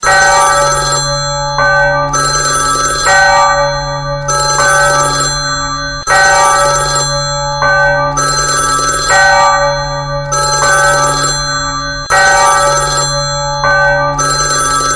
phone church bell ringtone

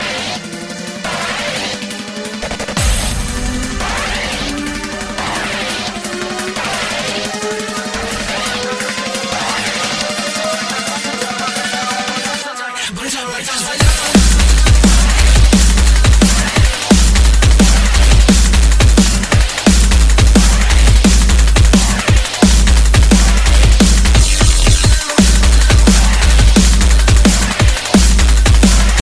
drum and bass ringtone

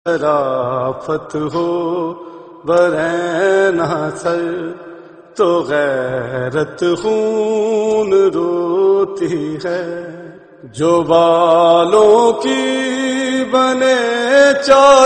noha tune ringtone

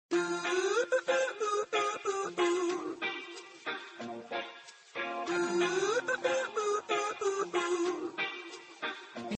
message tone ringtone

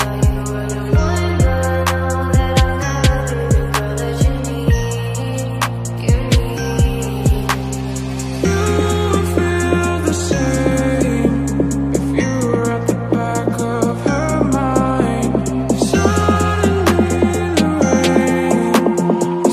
bass boosted; english ringtones

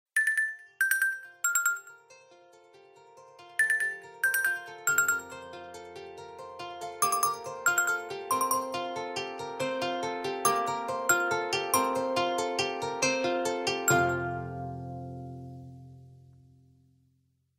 nokia remix ringtone

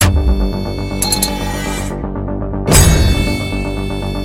motorola razr ringtone

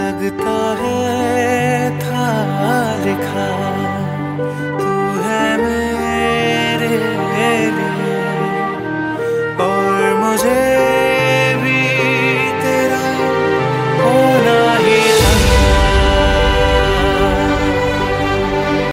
hindi ringtone; bollywood ringtones

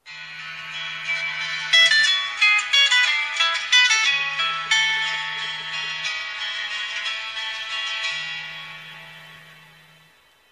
nokia tune ringtone; nokia 100

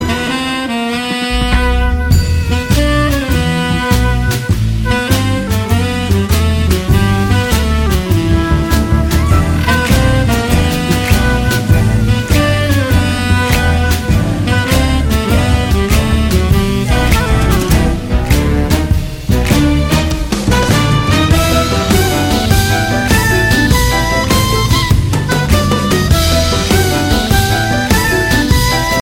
disco music instrumental tone ringtone

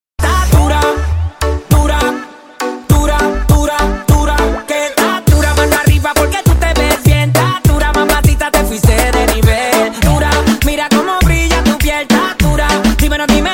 trap ringtone; iphone

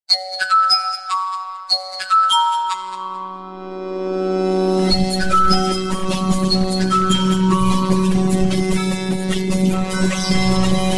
oppo realme original ringtone

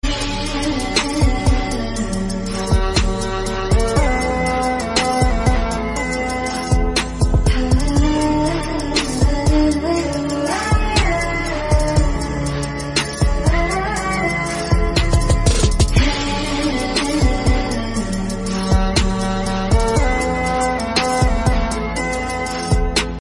arabic ringtone

